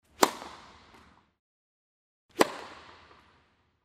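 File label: tennis hit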